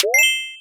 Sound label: chest open